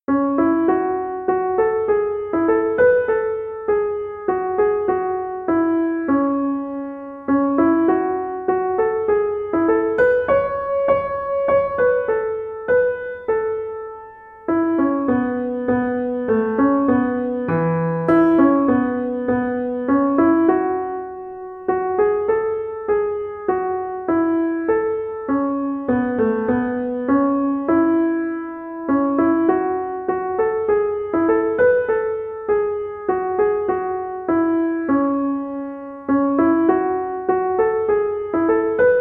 holst jupiter, keyboard

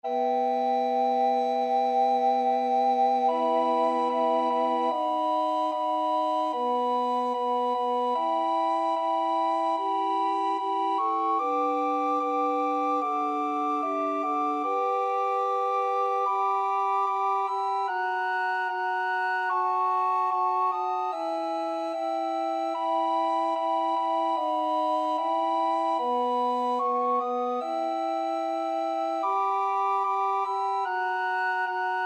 recorder quartet

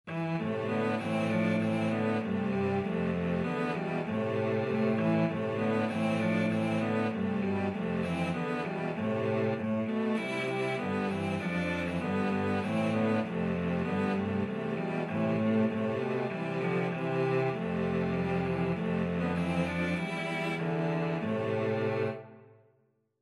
carol, cello trio; huron

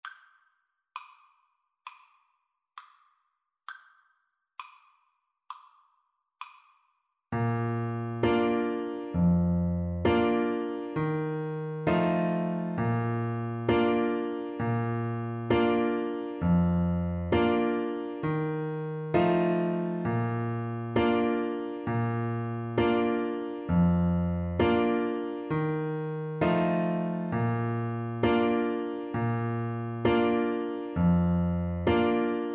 alouette FL kar, Flute

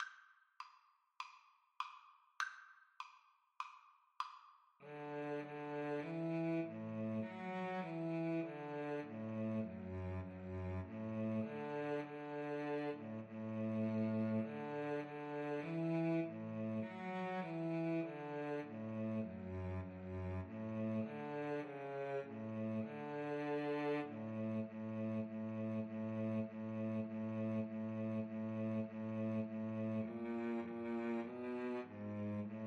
ode to joy, Cello Duet; beethoven

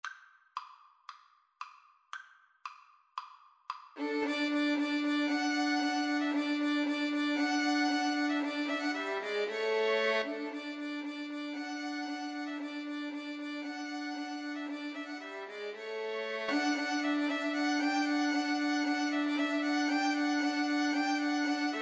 spring, Violin Trio

